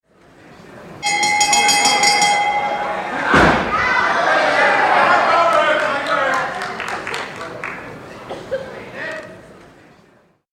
Bell - end of round, into quiet chatter; Miscellaneous Sport; BBC

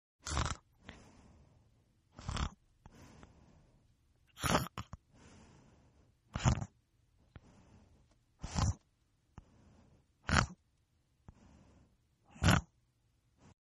Snore; Snoring; Human

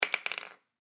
Food Whip Cream Can Cap Drop On Counter

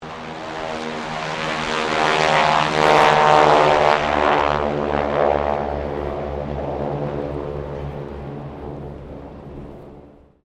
AIRCRAFT HARVARD SQUADRON 3 TAKEOFF; Southern Knights Squadron; Airplane Sound Effects; Airplanes (Sound Effects:Vehicles)

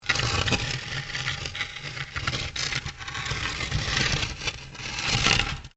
Stone On Stone Large Scrape; Material components for constructing more complex sounds; Scrapes

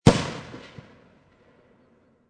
M224 60mm Mortar Fire; VRSonic; Guns

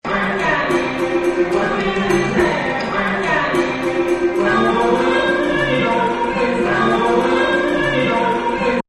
Vietnamese Folk Music; Concerts; Backgrounds; Live Music Events

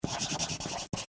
Office Pencil Write On Cardboard; Writing; Human

